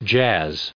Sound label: jazz